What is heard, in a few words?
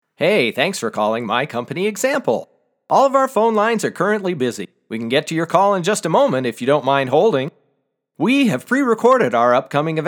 On Hold Sample